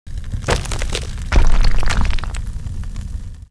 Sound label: die